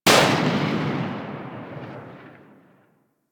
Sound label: Harpoon Gun Tail; Warframe; Sharkwing; Grineer